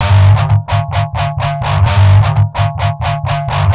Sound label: Weak Drive, Industrial Nasty